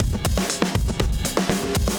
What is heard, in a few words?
Beat Style, 120bpm, breaks